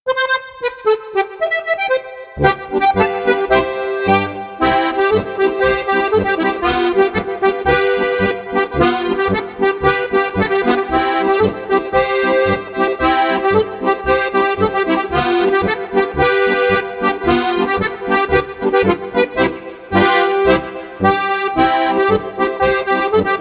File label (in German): polka